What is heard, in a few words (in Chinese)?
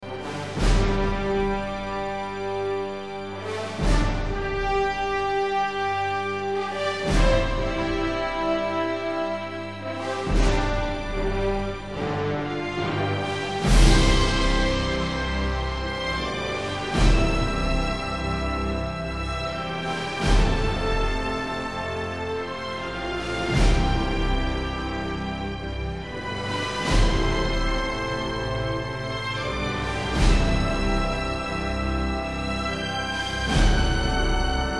深沉的背景音乐; 1分以内